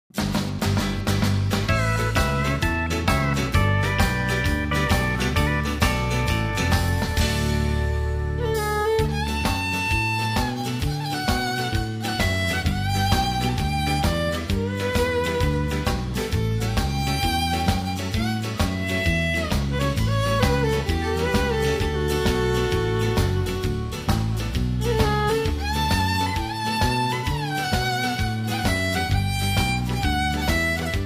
Country Shuffle

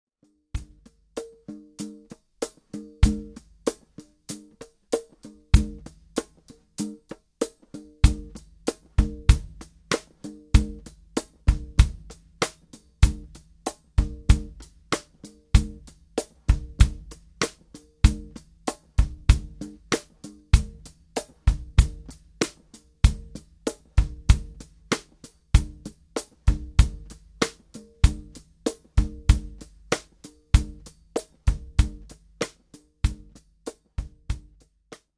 Drum Sounds hand drum

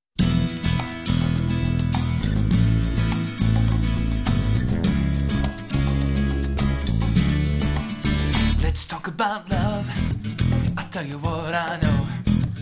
Percussion Track

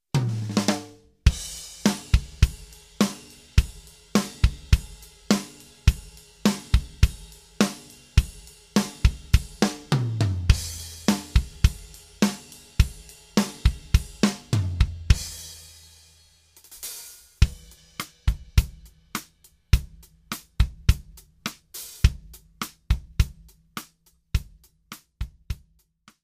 Drum Sounds

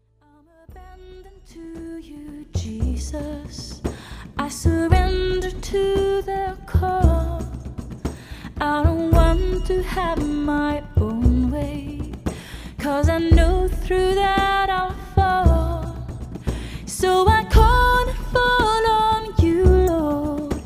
Percussion Track

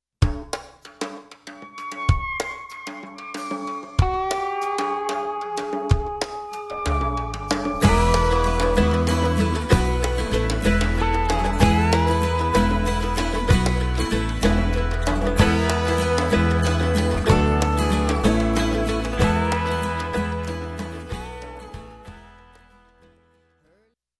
Hand Drum groove; Nashville Demo

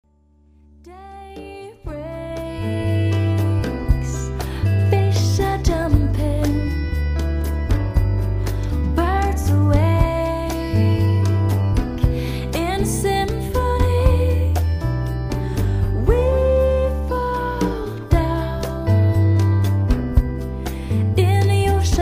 Percussion Track

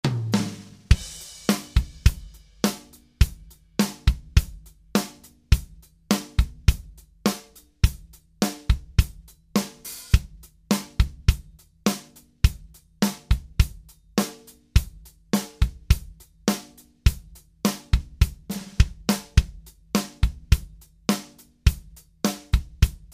Drum Sounds

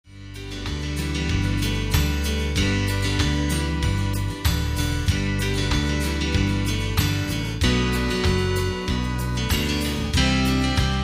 Contemporary Two Beat

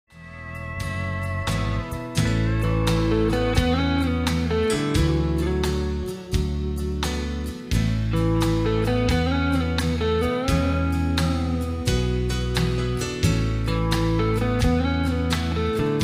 Slow Shuffle

